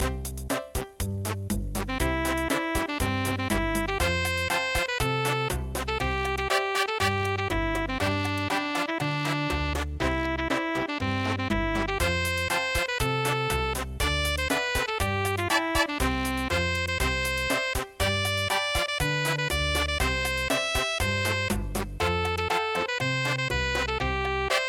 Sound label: chachacha; CLICK GO THE SHEARS